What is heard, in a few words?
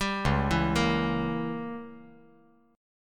Db Diminished 7th Db down; arpeggiated; JGuitar